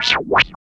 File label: STATIC; East West - Phat & Phunky